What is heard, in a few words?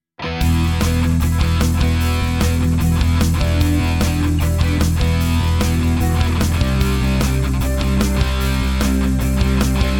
hard n heavy song